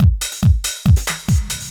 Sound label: DRUM, HOUS-TEC DRUMS; MAGIX